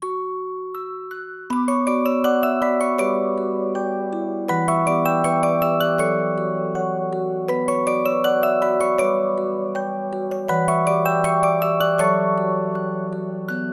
Gustave (Music Box); Clair Obscur Expedition 33 (2025)

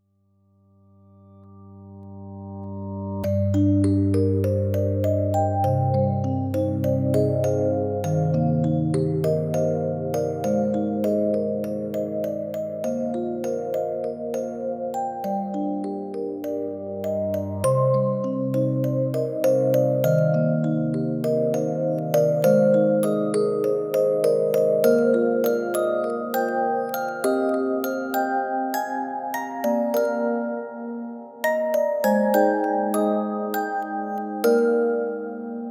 Sciel (Music Box); Clair Obscur Expedition 33 (2025)

